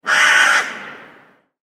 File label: loud crow call